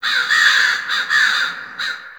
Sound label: Fall Crows; Warframe; Ambience; NineteenNinetyNine